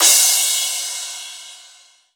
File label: big crash cymbal single hit a sharp key; cymbal drum samples